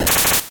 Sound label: 8bit se